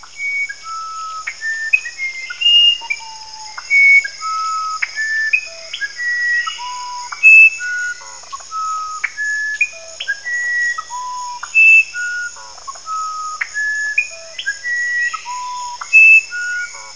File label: musician wren, brazil